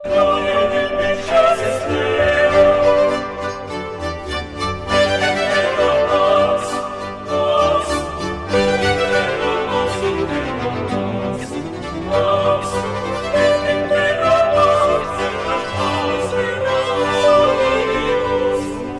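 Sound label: Gloria (sop), VirtualVoice; Stage Zelenka 2023; zelenka zwv21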